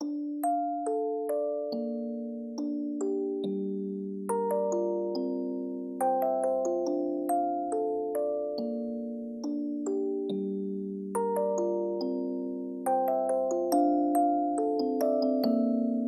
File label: Music Box